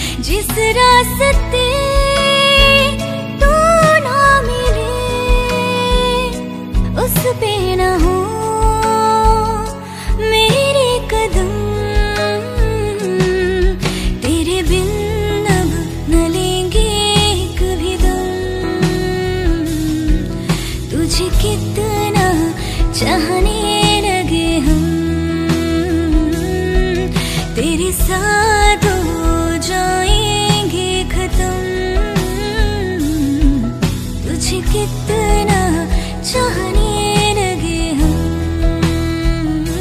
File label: Female Version Ringtone